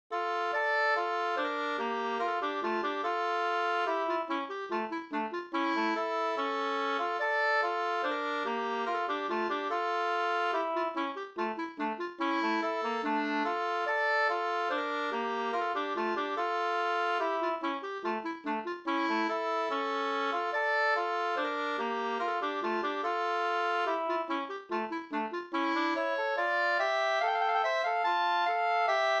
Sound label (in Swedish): schottis; Allmogel Gavle